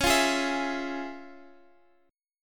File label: F Minor Major 7th Sharp 5th, Db